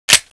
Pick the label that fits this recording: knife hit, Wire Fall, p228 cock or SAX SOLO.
p228 cock